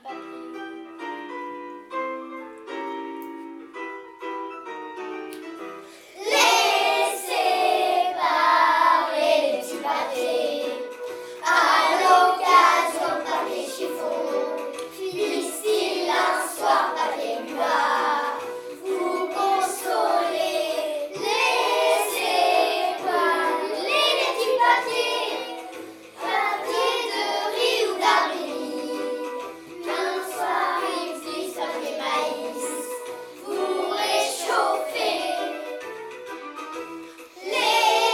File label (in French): chante